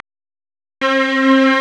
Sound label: STRNG; CMP - Analogon